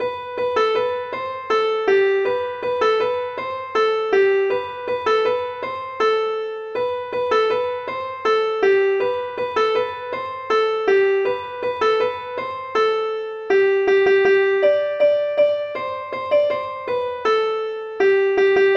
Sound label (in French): suite hanter